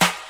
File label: snare sample